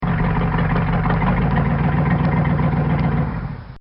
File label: Shut Off, Equipment; recorded in a custom built sound booth